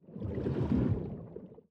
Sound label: spin start; netent